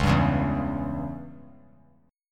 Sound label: Db Diminished Db down; strummed; JGuitar